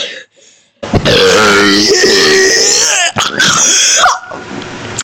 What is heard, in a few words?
biggest burp ever